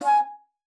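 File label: G#5, bass flute; Xsample VOL-2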